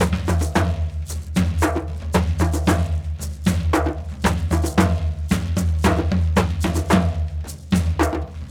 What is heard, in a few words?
Bag Pipe Groove, Rattle, Brekete, Djembe